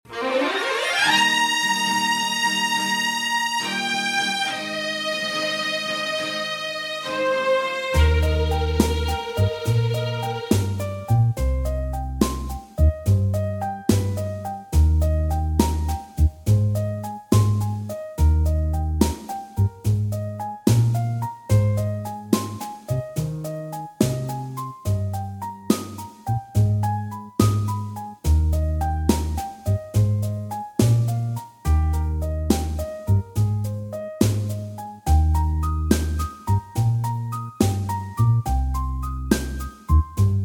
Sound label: Eb No Backing Vocals